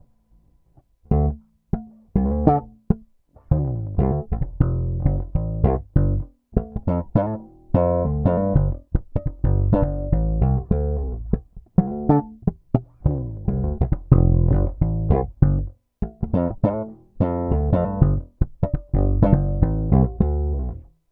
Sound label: Neck Slap Tone DOWN; peavey shootout audio samples